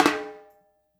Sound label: FILL, Percussion